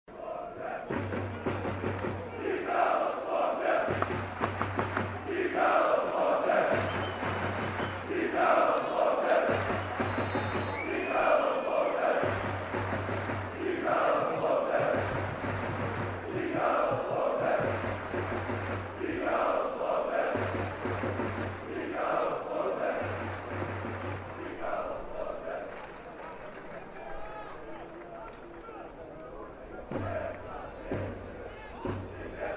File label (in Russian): Звук криков болельщиков на стадионе